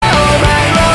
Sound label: Nocturne (Drum & Bass Remix)